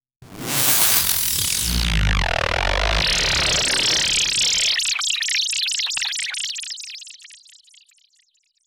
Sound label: effect swipe sfx radio, rock